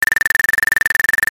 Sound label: fishing reel